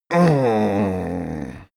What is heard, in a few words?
male grumble strain release; menschen; koerpergeraeusche; soundpool